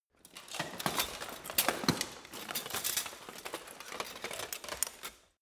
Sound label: get up